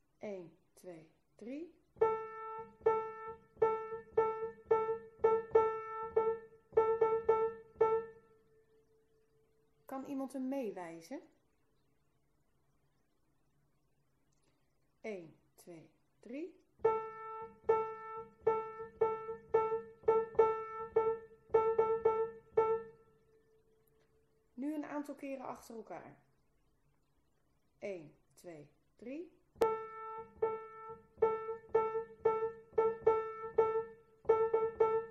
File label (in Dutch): morse gespeeld; muziek verhalen groep 5 6; de naam morse gespeeld